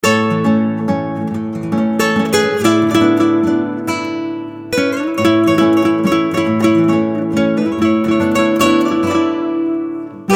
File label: Italian Stings